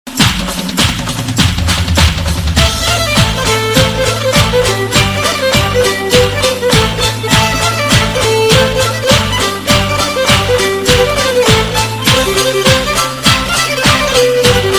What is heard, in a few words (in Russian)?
lezginka skiripka